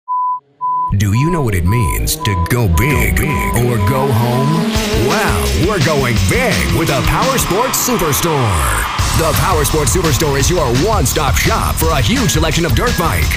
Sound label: HEARTBEAT